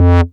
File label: Bass Multi Rev F; Vengeance Samples